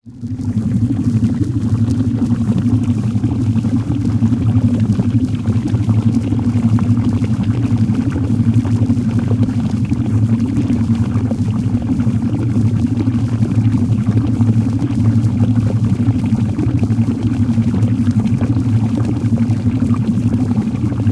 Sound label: synthetic liquid long texture; Bluezone Corporation; Synthetic Liquid Textures